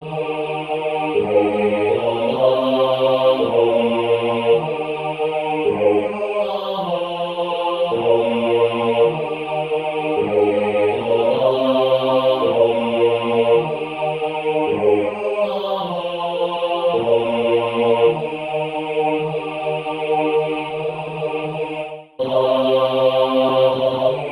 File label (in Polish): Alleluja Bas na tle SATB